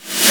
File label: Snare (Reverse), Yamaha DD-7